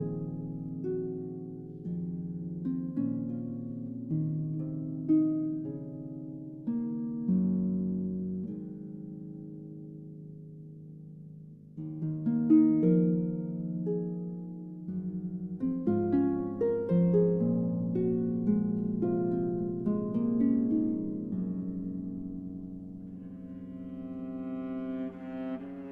Harp Concerto Lullaby

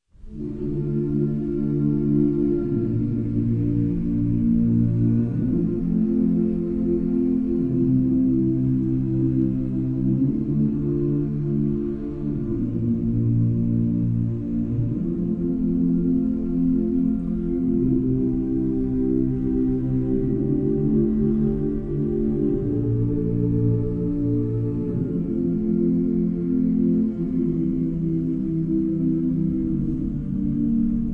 piano, and 12 Ensemble

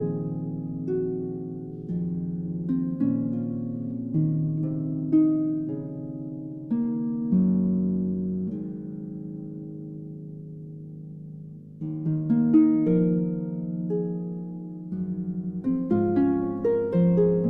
Harp Concerto Lullaby

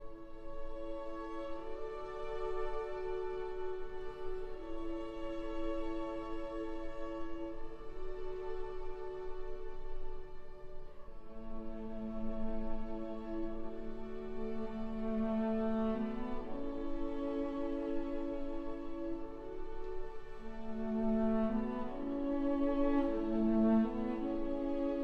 for cello and strings

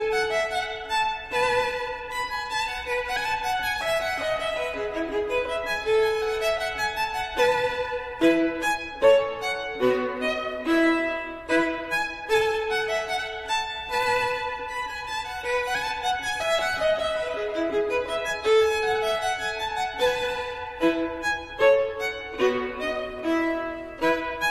Gavotte en rondeau, Violin; Bach, Johann Sebastian

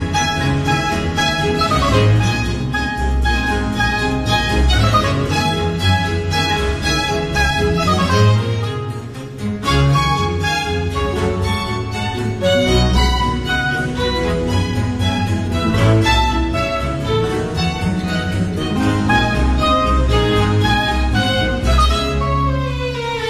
Concerto for Oboe in D minor, Baroque Orchestra; Opus 8 No 9

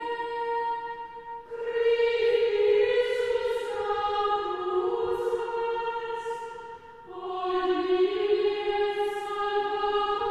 Chant Antiphon; Traditional; Chester Processional

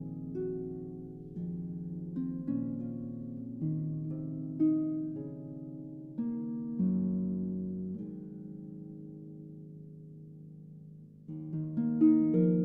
Harp Concerto Lullaby

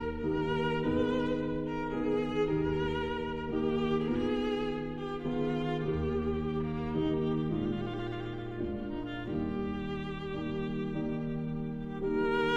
Sicilienne for violin and piano

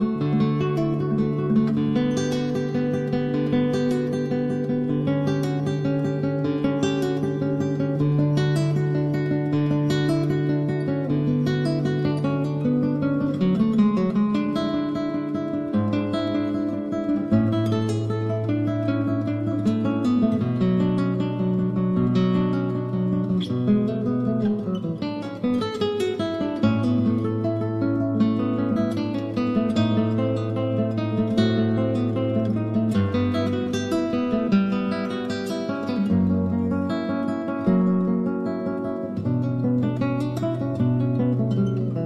Cello Suite No. 1- Prelude

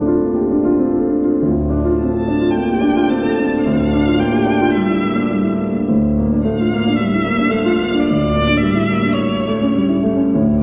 Berceuse (fr. 6 Pieces for violin and piano); Ambache Chamber Ensemble

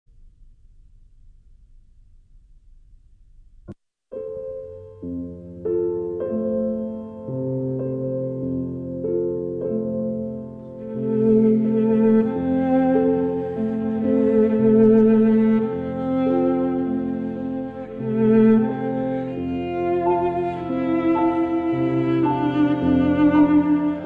cello, Lullaby; Opus 49 no 4; Cradle Song

